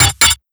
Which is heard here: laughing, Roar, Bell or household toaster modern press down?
Bell